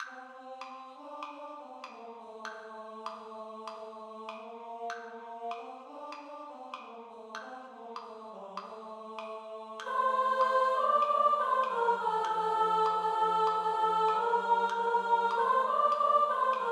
Halleluja Kanon nach Mozart Alt; Kantorei

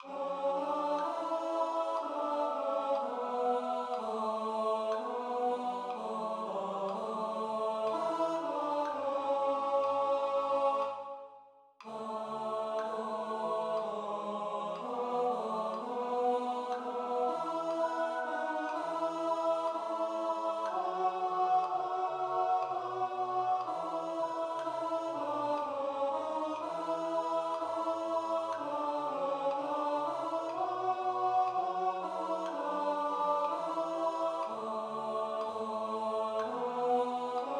Dein Glanz Tenor; Kantorei

